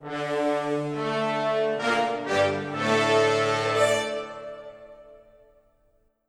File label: Music Fanfare